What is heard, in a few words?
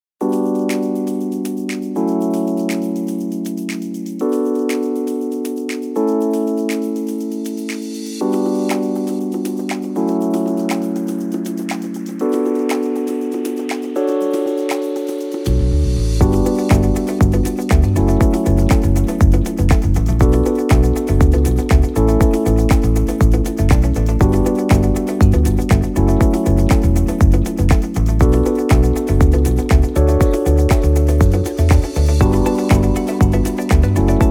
Lounge Music; música independiente o libre de derechos